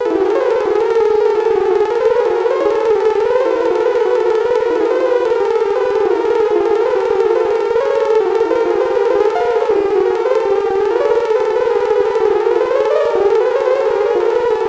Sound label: pentagram Genome Sonification